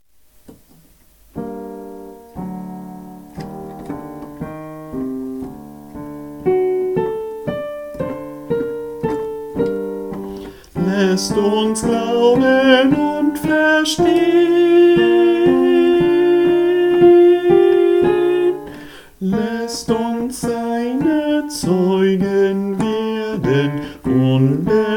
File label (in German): Jauchzet Tenor